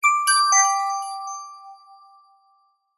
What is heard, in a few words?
multimedia bell chime alert synthesised positive